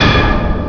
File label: POWER; Duke Nukem 3D Kill-A-Ton Collection